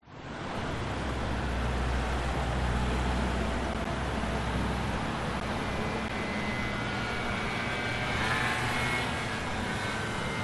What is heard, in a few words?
France Traffic; audio hero